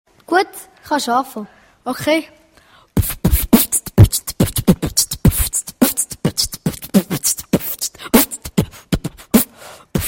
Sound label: Beatbox Battle; Schule Reiden LU